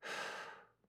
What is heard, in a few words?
Person Blowing; Fairlight Sound Lib